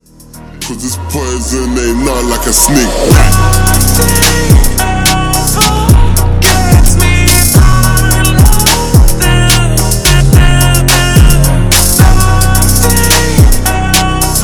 slowed bass

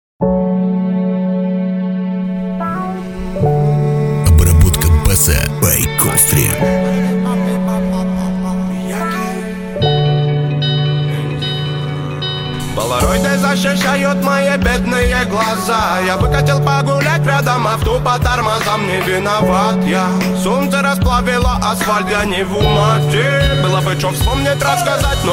bassboosted

